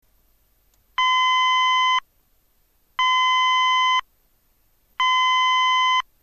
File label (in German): ton, Signalgeraete